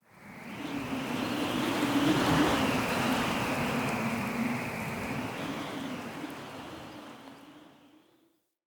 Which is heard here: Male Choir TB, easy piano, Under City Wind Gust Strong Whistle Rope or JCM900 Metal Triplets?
Under City Wind Gust Strong Whistle Rope